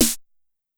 Techno Snare; drum samples

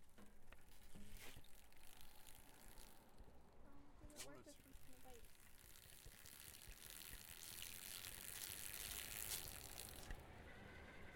landscape; Listen01 Sample Pack

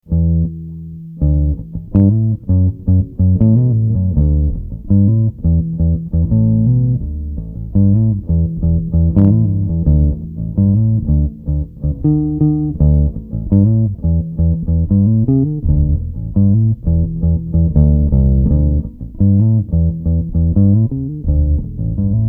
Girl Bassline; Casper Decker; Redsk

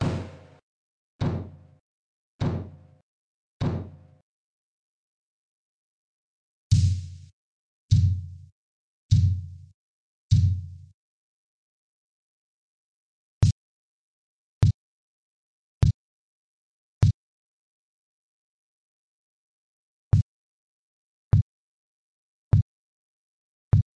Kick Complied, Kick Drum Proccesing

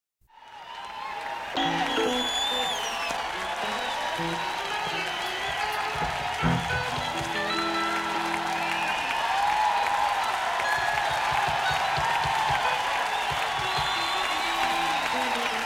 Crowd tuning

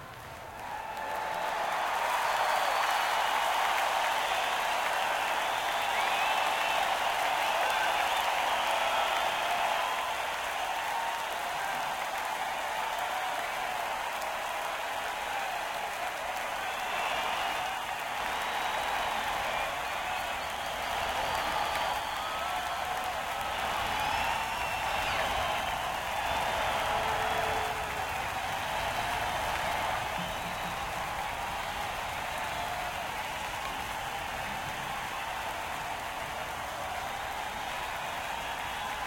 Encore Break

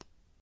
Switch, drum samples

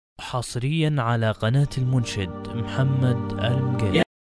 Feel Good Nasheed (Vocals Only); Arabic Nasheeds

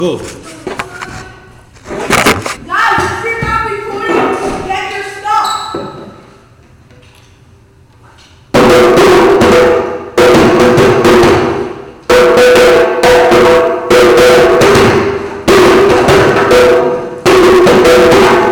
AFRICAN BELLS STUDENTS PLAY

